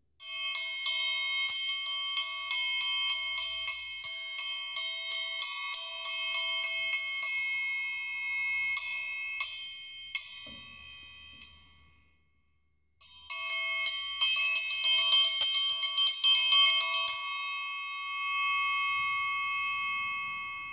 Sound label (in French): triangle; vie de l'ecole